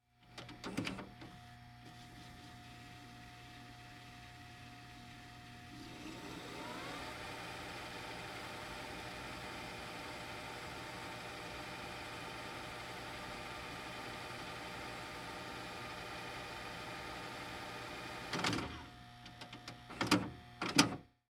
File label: Vcr Fast Forward Tape; Tape Players (Sound Effects:Electronics)